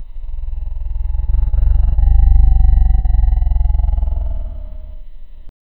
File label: a groan sound, beast